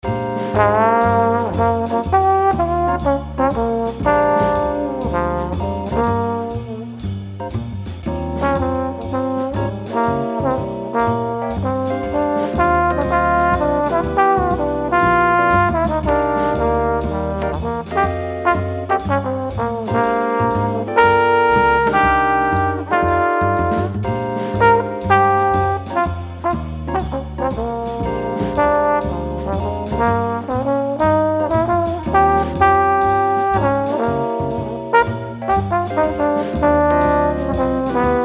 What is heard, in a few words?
Two chorus segment for Bb blues